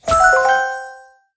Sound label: Drill; Angry Birds Sounds